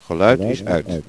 Guit

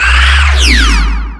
massive energy beam; Earth and Beyond; EA Games

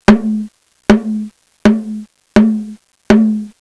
Mid Tom Drum; Soundsation; Music

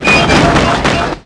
ROT SNAP; tapache

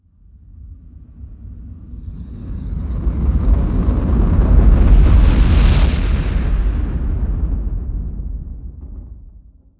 Asteroid; ImpGal2

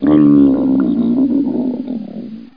moan; Hexen II; mummy

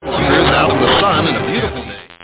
radio; Internet 1996 World Exposition; Japan; Panasonic

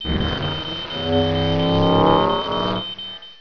plasma

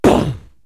Large Poof; KABOOM! - Wild and Wacky Sound Software; Beep Sounds; Bings & Boings

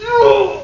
DEATH; FinalOdyssey

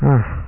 haa

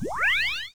zapup; Neverhood

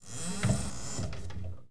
OPEN; THEREALM

